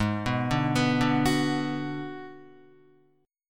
Ab Minor 7th Flat 5th Ab, down, Guitar, 4F, 4A, 5C, 5G, 5C, 6E; Abm7b5 arpeggiated